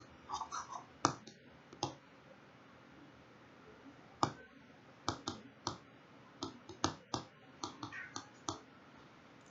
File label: Test Recording Noise